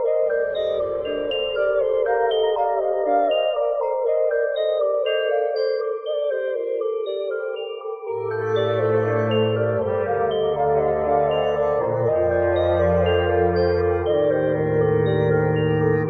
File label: voice and strings and keys; Sad; Loops